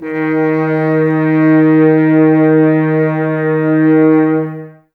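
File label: Brass French Horns D#; Sample CDs; Miroslav Vitous; Woodwind Brass Ensemble; F.Horns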